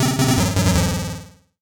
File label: MUSIC EFFECT Solo Chip C64 Negative; startide macos switch windows gamerip 2017